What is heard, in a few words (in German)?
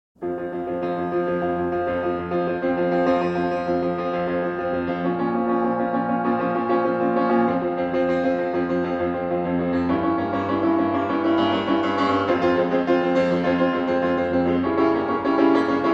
Klavierstück